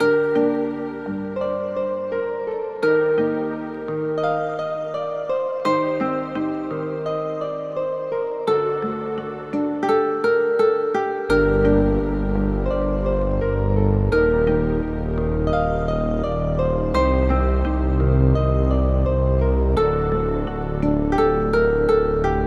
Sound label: Melody Loop 85 BPM G Min, Hip Hop; Melodics; Melody Loops; Cymatics; Cobra Hip Hop Sample Pack